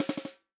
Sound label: snare drum, POP SNARE CHROMATIC; REAL DRUMS